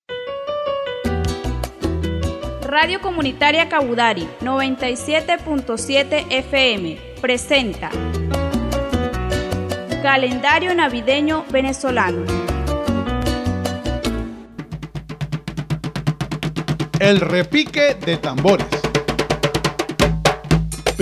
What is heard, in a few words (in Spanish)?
REPIQUE DE TAMBORES; Produccion Kabudari Mix